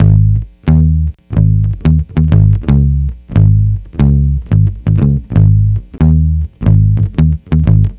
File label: Lounge Bass-G#; Zero-G Kocktail Kollection; Construction Kitz (Lounge); Welk Lounge 090